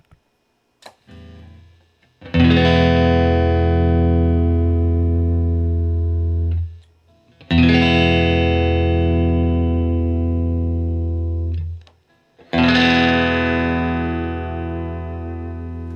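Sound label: Guild Starfire III P90 E Chords, guitar; 2002 guild starfire iii 90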